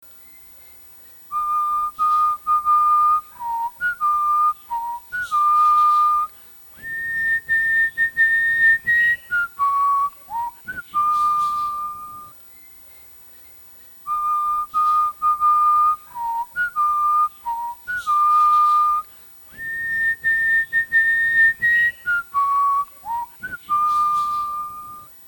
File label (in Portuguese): Canto de Calopsita